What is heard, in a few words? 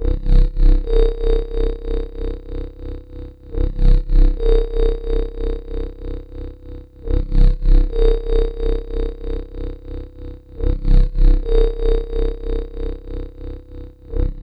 68 SEA WAVE, Dark Side Of TripHop